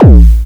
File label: BD, Drums Dance & Groove